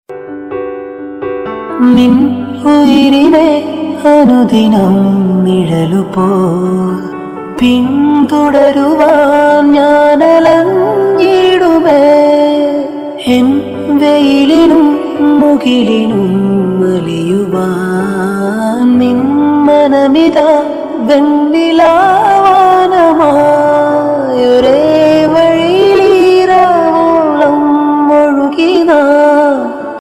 malayalam ringtone melody ringtone

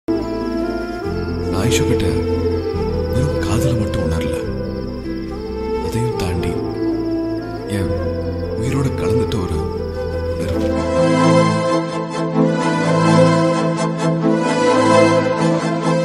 sad bgm; sillunu oru kadhal; surya

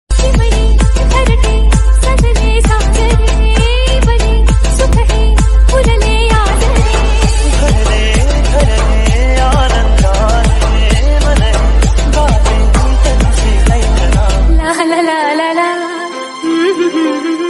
marathi ringtone

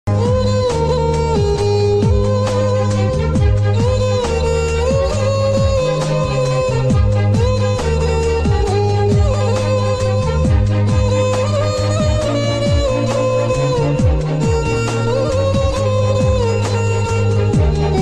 bgm

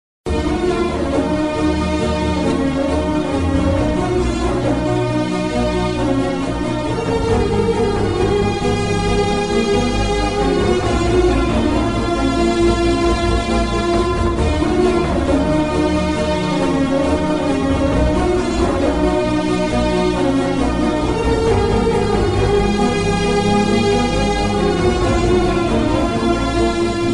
emotional sad bgm; supreme movie

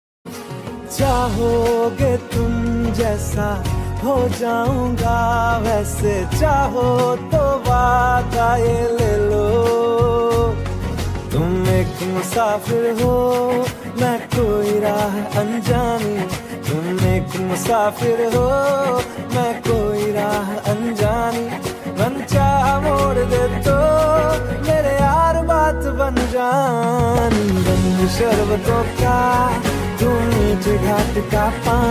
ringtone new flute ringtone

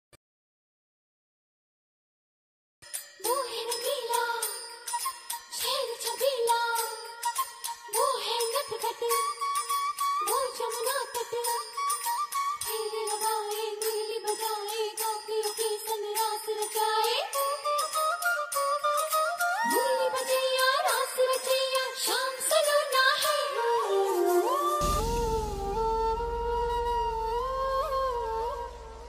krishna flute ringtone; shree krishna; janmashtami

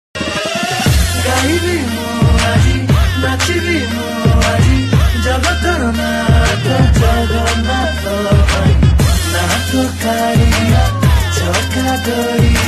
odia bhajan ringtone song

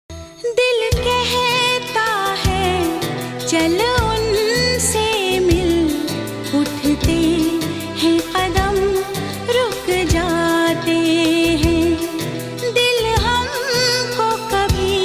love devotional song

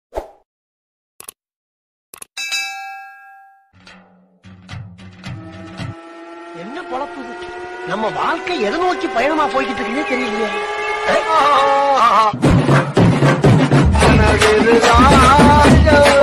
funny comedy bgm remix ringtone; vadivelu

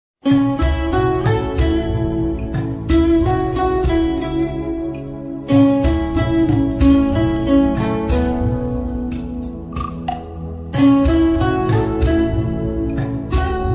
telugu bgm ringtones; bodhi dharma; 7th sences

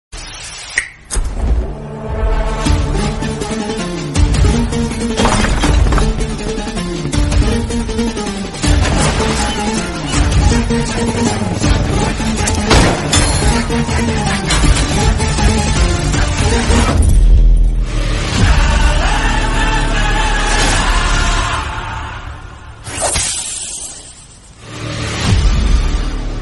telugu bgm ringtone; karthikeya 2

